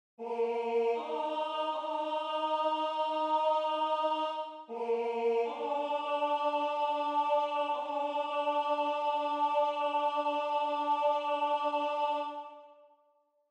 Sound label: Tenor, barbershop